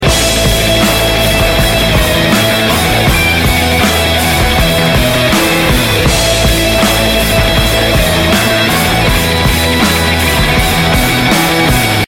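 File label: boss hand loop theme; svencoop; source of life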